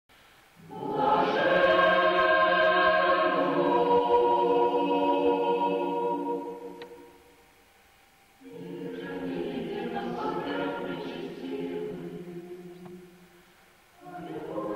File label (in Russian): Blazhen muzh